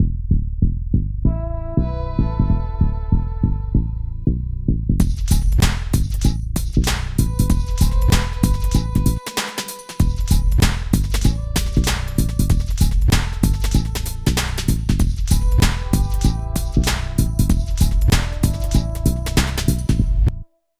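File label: LOOP, right; East West - Phat & Phunky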